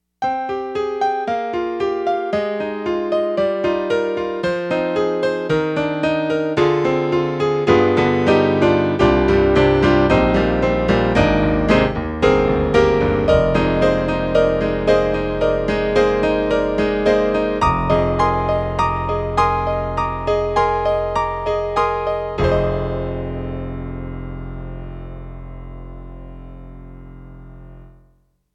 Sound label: YAMAHA TG55 Piano